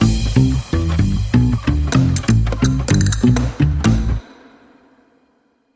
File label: Spanish Flair, Sting